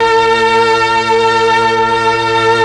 STR, M400; ILIO; Propeller Island Legendary M400

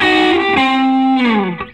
SOLO, Vintage Blues Guitar

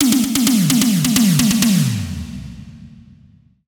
130 Beat Fill; Zero-G - Future Wave

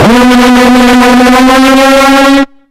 EL SCREAM, Hard & Loud Techno; USB Soundscan vol.01; AKAI

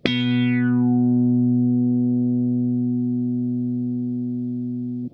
NOTE B2, Funk Guitar; 90 s Sample CDs; Zero G

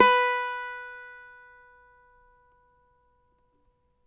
CAVAQUINHO; Spectrasonics; Hans Zimmer Guitars VOL-2

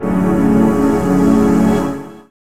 breath

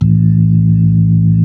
B-3, Club; Roland LCDP10 Keys of the 60s and 70s 2; Club Ch. Xfde

